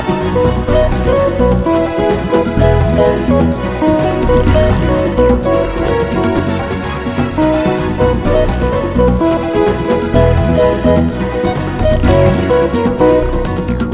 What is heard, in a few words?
Hot Sounding Samba